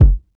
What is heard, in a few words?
hot bass drum sample g key, Royality free bass drum one shot tuned to the G note